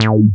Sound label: moog, bass; Ueberschall; Techno Trance Essentials